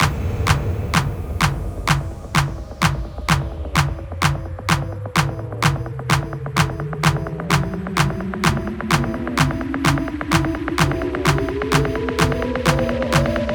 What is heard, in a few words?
128BPM Spooky Fill 16Bars A; Melody Fills; Vengeance Samples